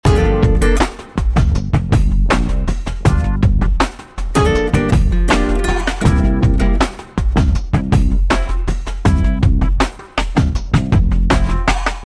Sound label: Loop